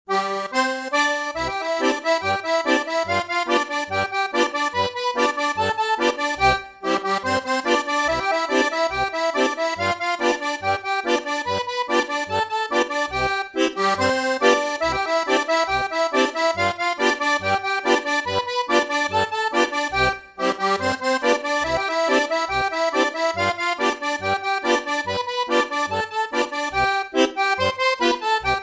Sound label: french two step, cajun